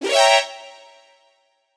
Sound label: Guard Alert Exclamation; Metal Gear Solid